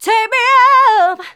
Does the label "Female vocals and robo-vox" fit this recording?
Yes